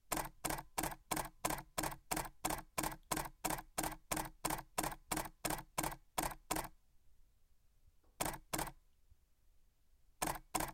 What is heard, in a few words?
Canon EOS 5Ds Slow Burst